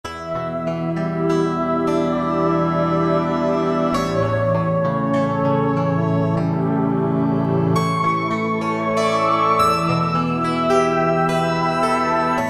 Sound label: Dulcimer Voice; Roland PN-D50-04